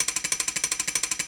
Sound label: Elevator Handle